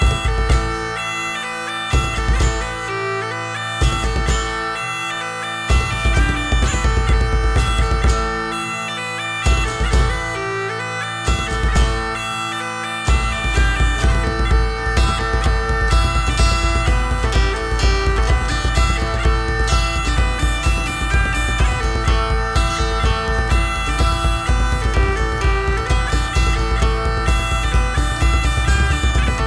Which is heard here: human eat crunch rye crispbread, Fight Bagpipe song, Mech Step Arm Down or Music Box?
Fight Bagpipe song